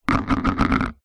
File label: household plate plastic childs down on surface